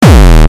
HardCore Kick

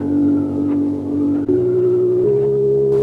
Scary Organ; Sound FX

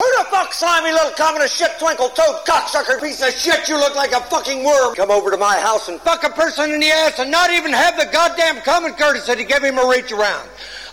fullmetal, audioloops

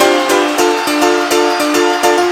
piano cheer, audio loops

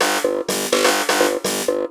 Buzz Snare; Sound FX

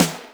snaredrum, Yamaha RM 50

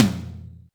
Yamaha RM 50 Toms

